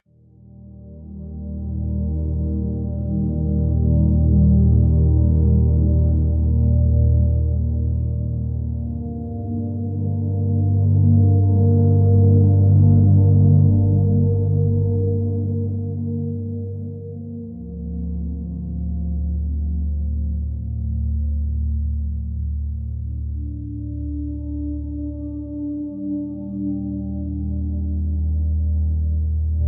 Floating Over Quiet Oceans, pure ambient for sleep